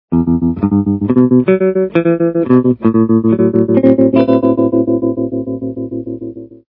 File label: tremolo; neck humbucker